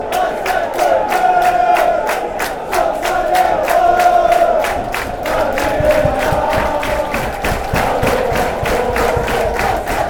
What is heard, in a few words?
claps